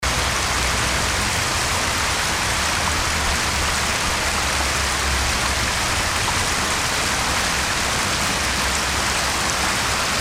city park fountain

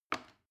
plastic button small release heavy

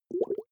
small bubbles

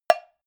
cowbell hit muted hard

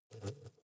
hornet hum short

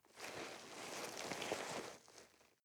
nylon leather soft rustling

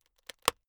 plastic slowly highly pressured

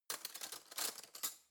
cutlery spoon drawer handle long

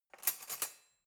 cutlery knife drawer grab

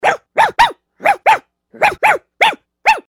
little dog barking

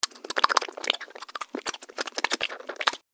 fast gobbling

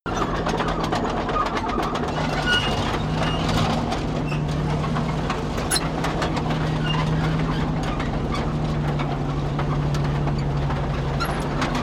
tank medium tracks rattle medium

